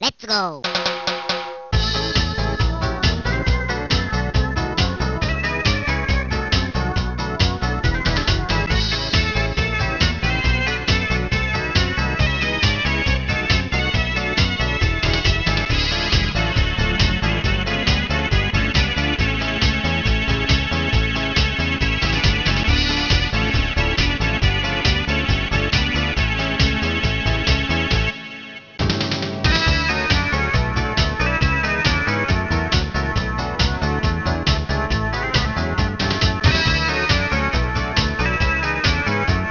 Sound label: Solo